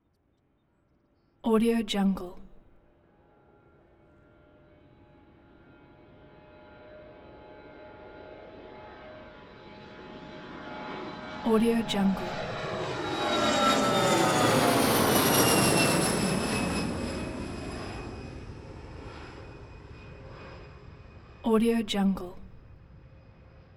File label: airplane passenger jet take off